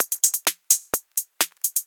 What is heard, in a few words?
Hihat Loop 128 BPM